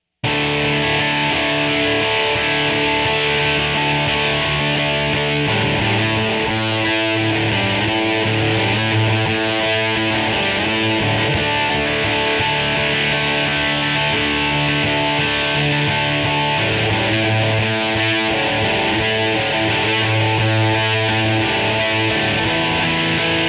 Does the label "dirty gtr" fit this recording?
Yes